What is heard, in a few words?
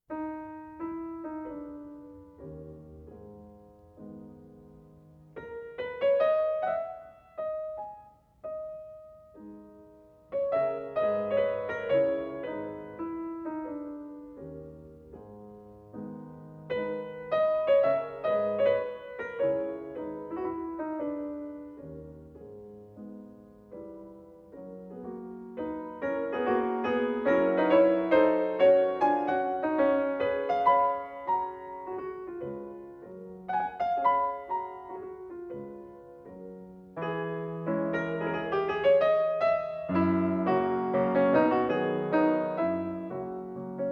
Mazurka No.22 in G sharp major, piano